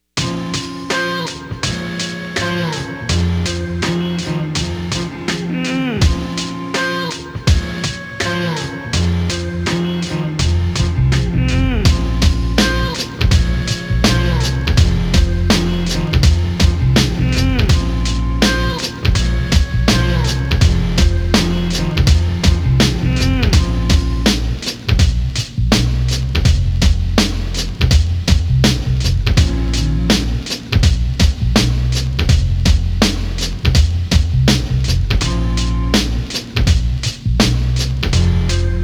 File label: Instrumental